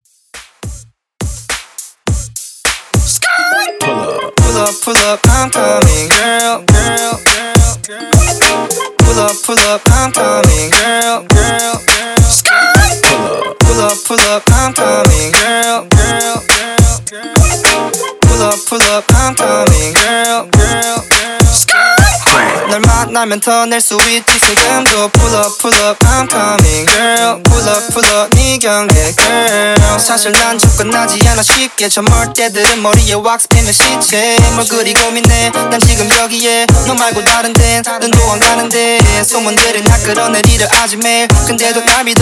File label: K Pop 104 BPM